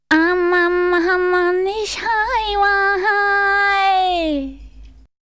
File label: Vocal; ZERO G - Datafile DISC 1